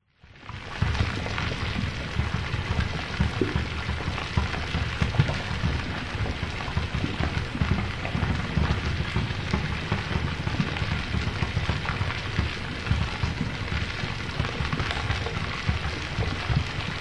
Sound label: horror cauldron witches boil bubble